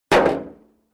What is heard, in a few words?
Impact Thin Hollow Metal On Ground Single Hit Stinger; Tom Hutchings